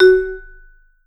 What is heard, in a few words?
Vibes; Sampleheads; Dave Samuels; Marimba & Vibes